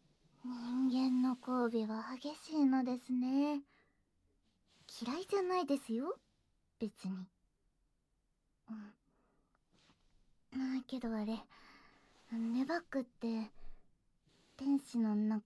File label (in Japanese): キス／囁き／耳舐め; 後半戦; 寝バック; 潮吹き; 絶頂; 正常位; ウサのエッグオナニー; ベロチュー中出し; 深イキ